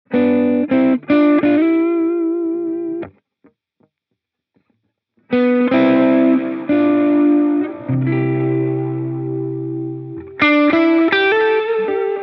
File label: Tape Old Tape